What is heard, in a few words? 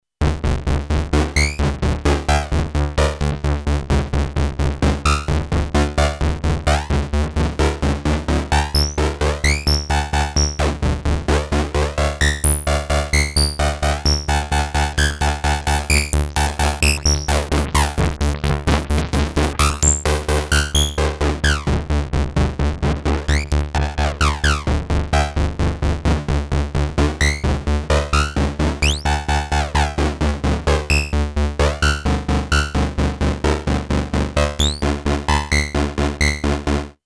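bass, Compact analog monophonic synthesizer; bass detune?; MFB nanozwerg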